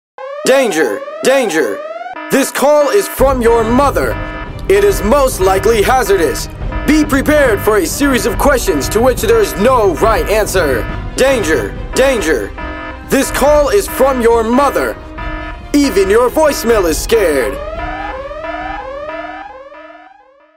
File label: best funny ringtone danger mom calling ringtone iphone ringtone